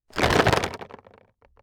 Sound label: Concrete Pieces Plastic Tub Rattle Fast Rattle; Foley